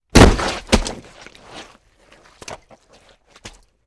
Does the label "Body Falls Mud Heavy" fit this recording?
Yes